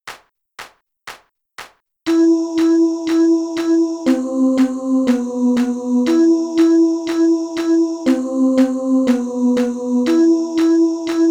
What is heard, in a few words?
boucle